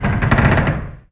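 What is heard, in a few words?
creak single short sharp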